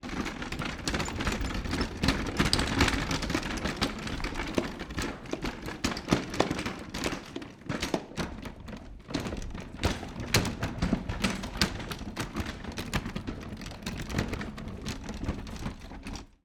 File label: wood cart roll on stone pavement in courtyard